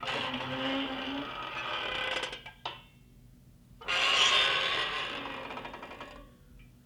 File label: Aluminum Door Screen Creak slow